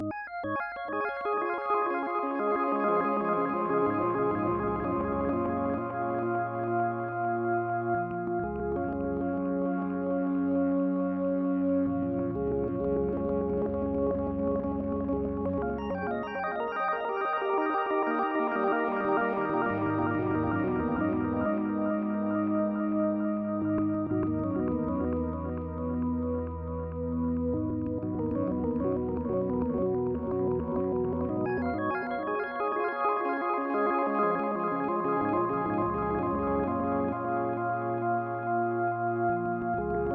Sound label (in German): TC2290 DT Orgel Pan Delay